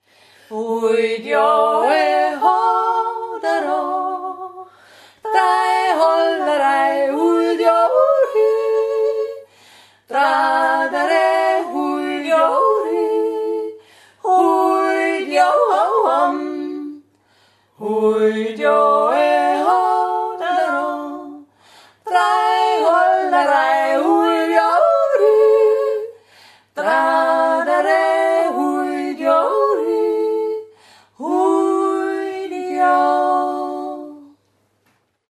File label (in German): gruss jodler